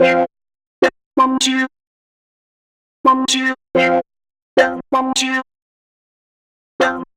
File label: House Loops, Vocal Loops House 128BPM; Vengeance Samples